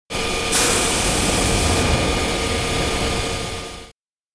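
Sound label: train door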